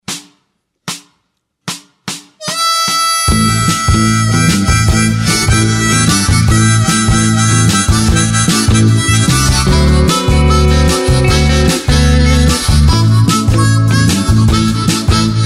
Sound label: Blue soul with Harmonica